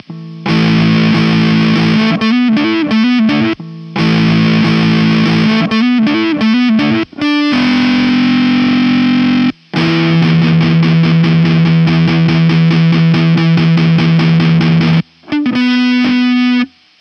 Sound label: Paranoid Riffs